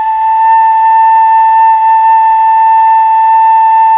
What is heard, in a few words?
KHLUI A4, KHLUI FLUTE; Spectrasonics; Heart of Asia